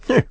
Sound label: Sneer; Barnes sneering at Renado